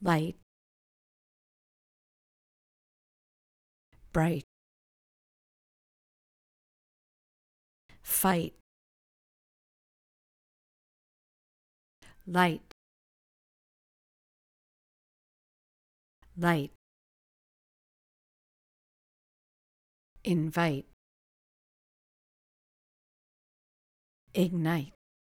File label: stems vox